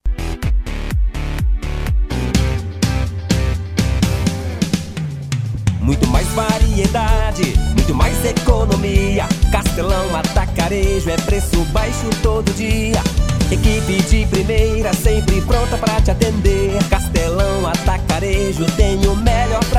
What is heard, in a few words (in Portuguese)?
jingle supermercado; studio89audio